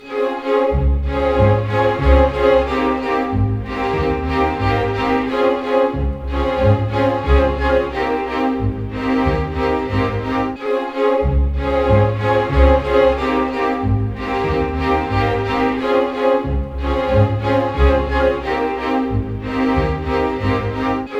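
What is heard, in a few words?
120, orchestra; East West; Scoring Tools; Romance Eve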